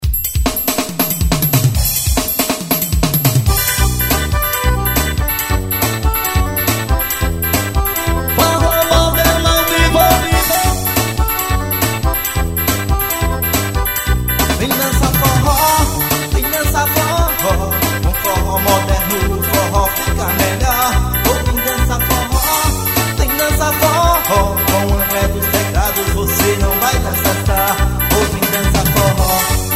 forro moderno vem dancar forro

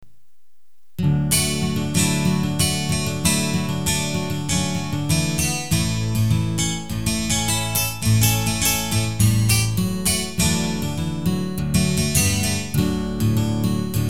keyboard violao caipira

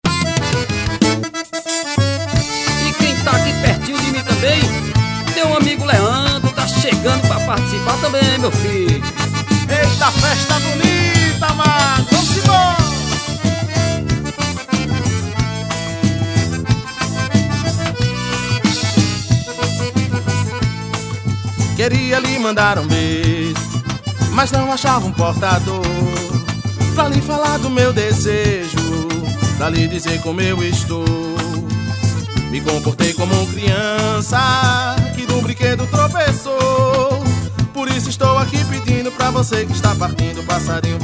acordeon

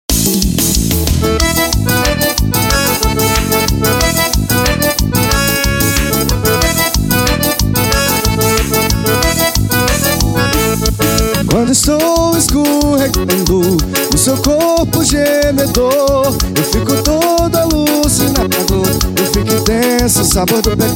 musica

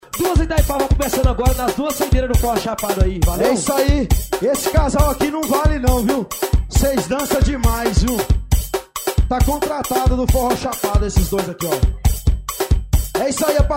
sanfonado doido ao vivo; no pesqueiro Fernandes

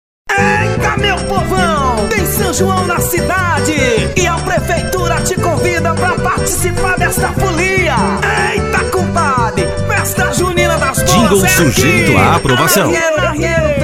jingle politico festa junina

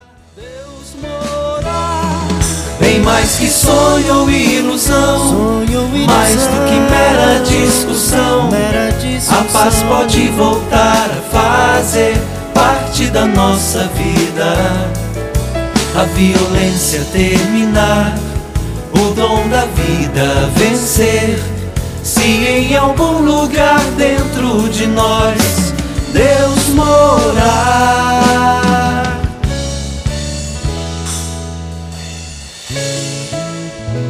canto de paz

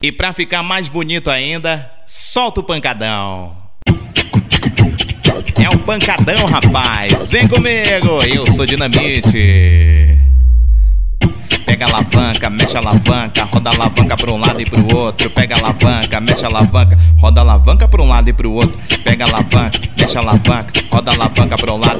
poutt porri funk

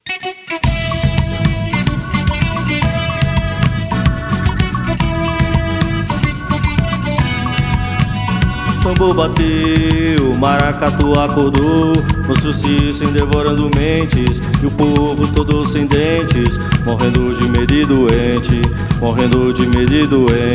maracatu

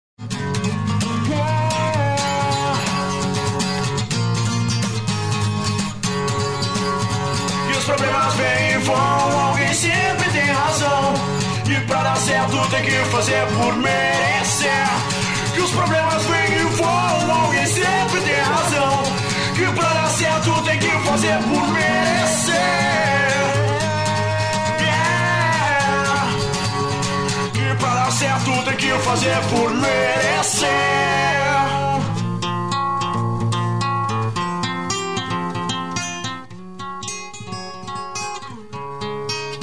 violão

